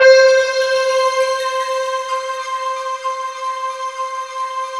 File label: GOA C 4, Korg; Back In Time Records; Korg Universe VOL-1